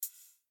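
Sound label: rabbit footstep; smite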